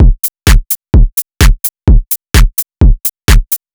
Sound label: lonely phonk drum loop; phonk toolkit; rocket powered sound